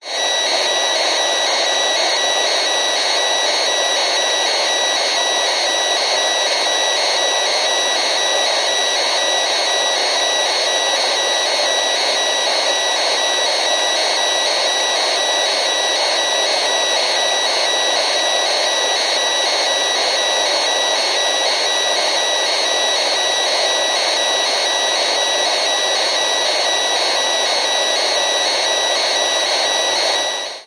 radio alarm clock stereo fm static constant beeping tone; technology; zapsplat